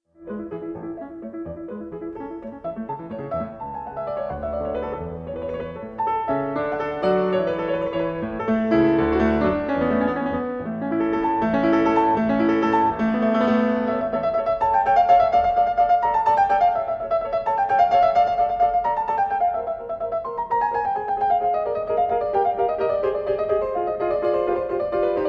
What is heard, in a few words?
sonata no 84 in d major for keyboard; padre antonio soler